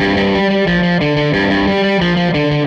Les Guitar Loop

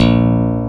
STICK; E-MU Formula 4000 Series Vol. 3 – Analog Odyssey; VK+ Instruments

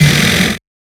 Snares, Alesis Performance-Pad

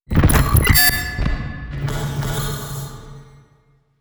portal glich

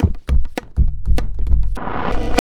Tabla, 100 BPM; Pure Tabla; Zero-G

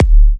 D, Kicks; markus hakala

